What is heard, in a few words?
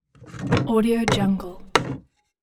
put and take object from the table; chicasound